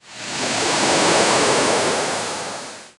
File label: water